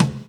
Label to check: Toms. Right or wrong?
Right